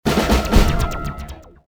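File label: capture the flag base; Soldier Of Fortune II Double Helix; XBOX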